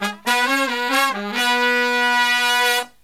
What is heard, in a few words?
Killer Brass Riffs, MED; USB Soundscan vol.29 - Killer Brass Riffs; AKAI